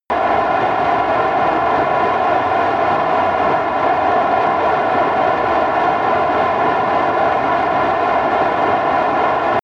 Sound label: military jet fighter afterburner damaged